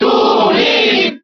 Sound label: Cheer Spanish; Toon Link; SSBB